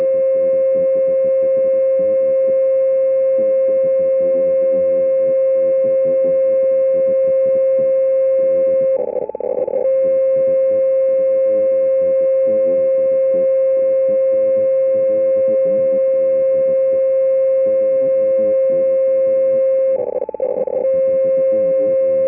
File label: dcf big ant